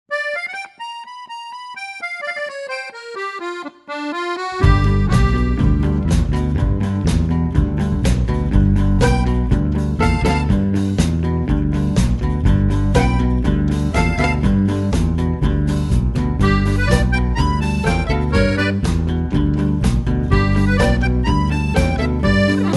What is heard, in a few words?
Cafe Accordion Orchestra